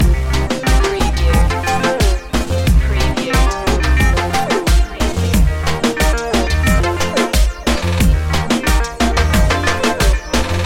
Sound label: moving reggaeton loop